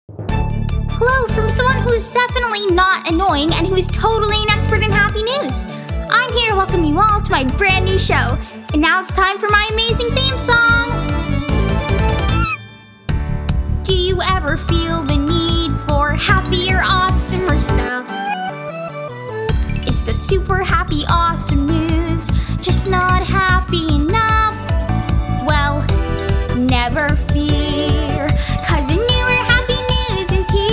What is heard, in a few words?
VOCAL